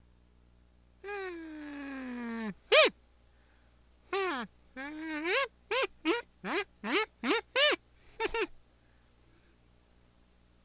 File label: Kazoo Fly Talks